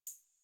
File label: Computer Room Beep Misc; Warframe; Ambience; Nineteen Ninety Nine